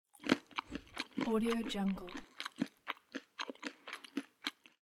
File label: man eats peanuts